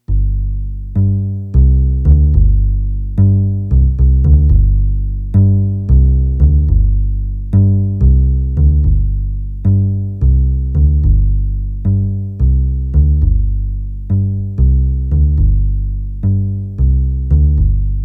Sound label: key bass